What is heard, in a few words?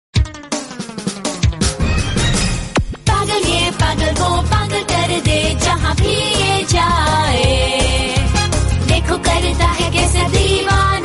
Title Hindi Song, ringtone; Shinchan